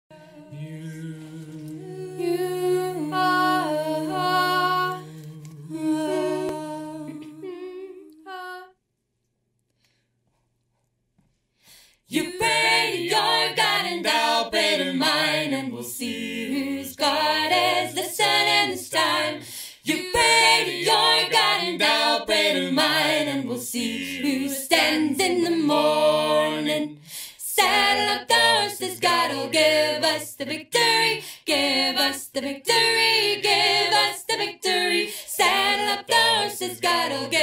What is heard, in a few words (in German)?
Akustik Session; Hochschulradio Aachen